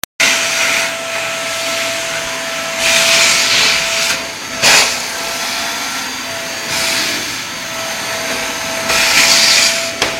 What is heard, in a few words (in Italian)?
rumore fastidioso